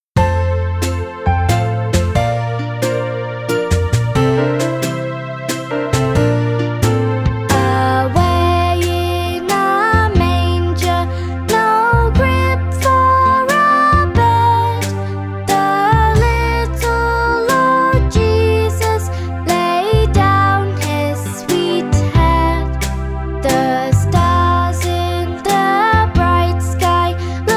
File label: Away In A Manger VOCALS